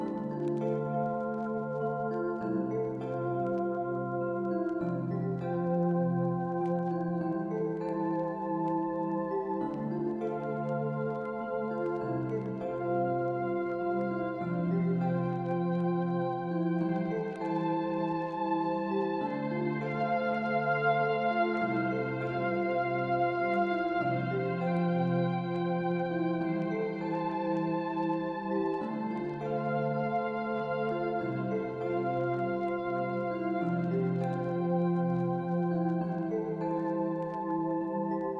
Music Ambient scene; Game rip; MiSide

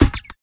Hammer Hard Kick; game audio; Mita; MiSide

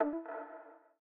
Return Case; MiSide

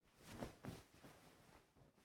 Movement; Game rip; MiSide

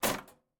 Electro Close; Game rip; MiSide

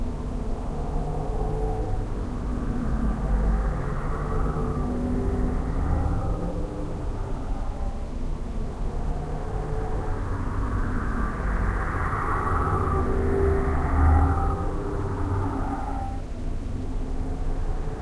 Ambient; MiSide

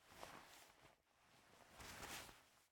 Player Try Exit Door; Game rip; MiSide

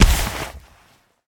Side Kick; Game rip; MiSide

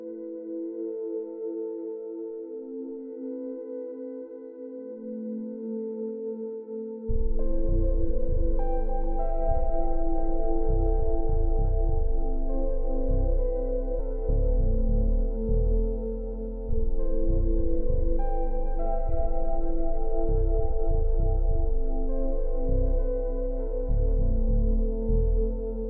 Ambient; RealLife; MiSide

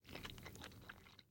Eat; MiSide

